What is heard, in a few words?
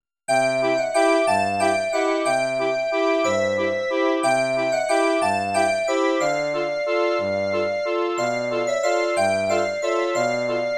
Music Box House; The Legend of Zelda Majora's Mask